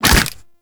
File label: Desert Skate Attack Fire